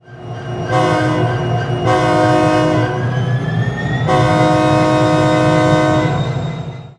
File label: Nathan K-3HA-R2; RailPro